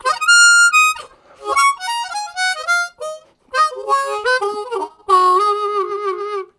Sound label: Highish, Harmonica Riffs; Zero G